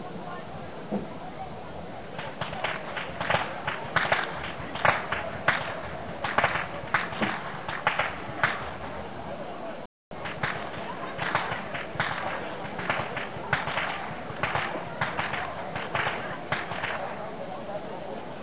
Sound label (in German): schnalzen, jugend; Sillersdorf